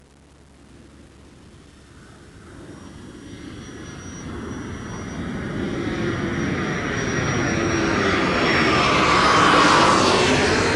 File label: aircraft sound and effects